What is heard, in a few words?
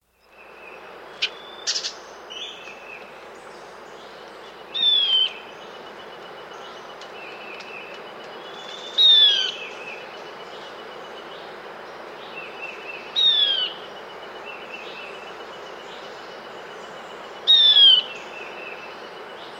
Tawny-Faced Gnatwren, S. America